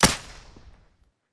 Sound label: uzi silence; Tacz1.1.5+; RSWS 1903